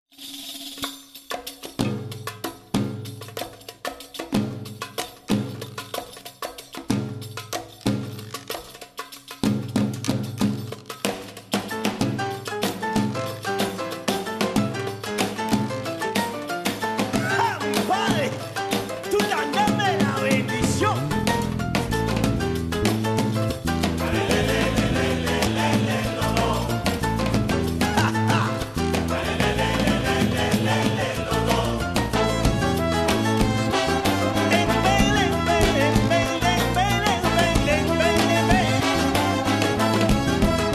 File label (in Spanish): son cubano baladi